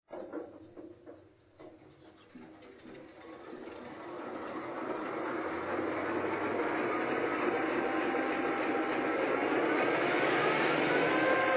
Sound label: GP9